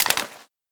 mag, c96; svencoop; ins2

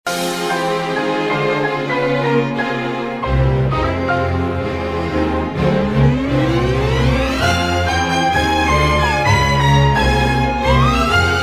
piano; svencoop; FZSaySound

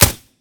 shoot, mp5sd; svencoop; ins2

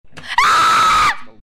scream; rushia; svencoop

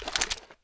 mag in, m60; svencoop; ins2

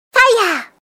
fire; svencoop; FZSaySound

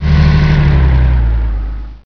deccelere, tank; svencoop

